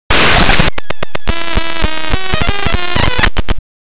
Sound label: glitch